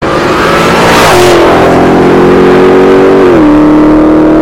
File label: Dodge SRT Demon